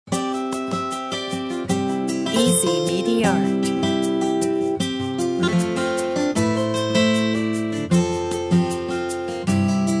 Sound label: country